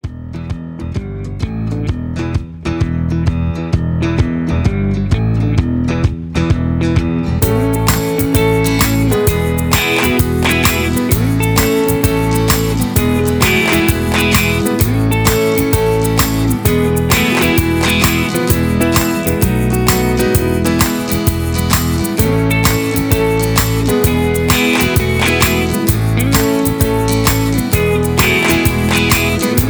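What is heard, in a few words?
with melody no background vocals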